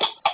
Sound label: Sounder Dash; NuMorsePro